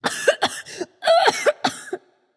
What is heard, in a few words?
clear throat light; smite; voicepack; hel